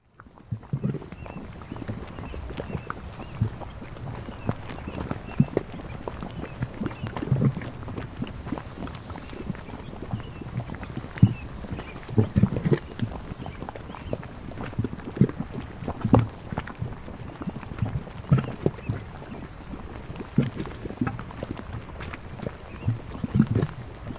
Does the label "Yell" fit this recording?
Yes